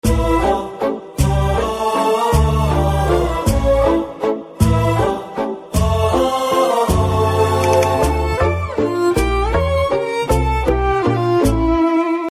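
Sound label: background Ringtone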